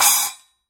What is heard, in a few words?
Paiste PST8 10 rock splash choke